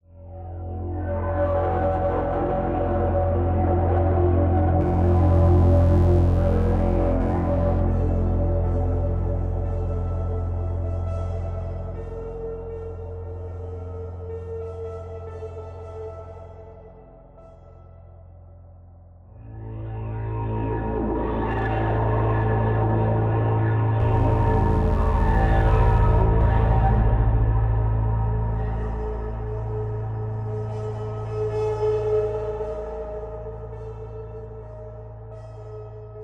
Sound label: basement reviver base, music; Half Life Alyx; hotel